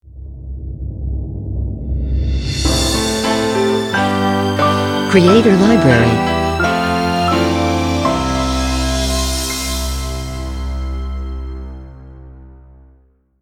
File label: Logo Opening Orchestral; Logo-Idents